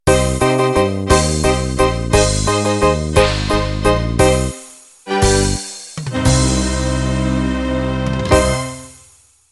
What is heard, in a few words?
Viennese Waltz End